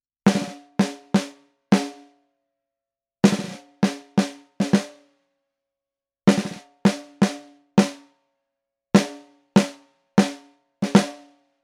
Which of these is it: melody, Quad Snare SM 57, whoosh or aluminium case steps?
Quad Snare SM 57